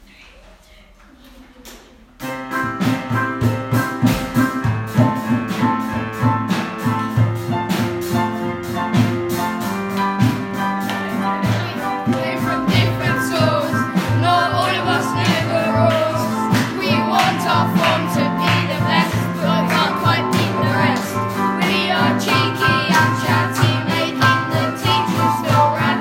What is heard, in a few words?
House Music